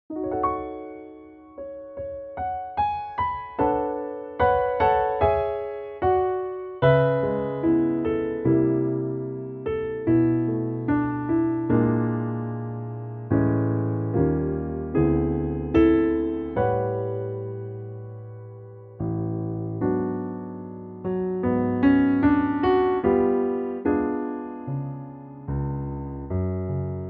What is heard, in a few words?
A piano; key - A - vocal range - C# to E; piano only arrangement